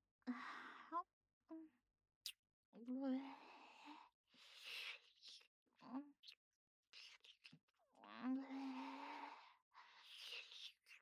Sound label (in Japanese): キス、激しい喘ぎ, ASMR; アドリブ集